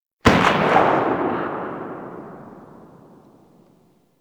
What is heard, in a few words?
Tail; Grn Ogris